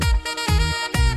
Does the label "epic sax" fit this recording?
Yes